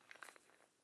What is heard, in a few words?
electric drill bit fasten wrench turn slow